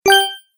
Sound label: Bright Complete, Beep